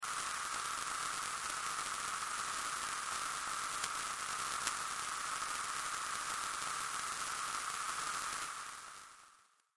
Static Fuzz Hum Hiss Airy, Static Noise Pulse Swells; Production Element Imaging Element Accent Transition; Blastwave; Static Sound Effects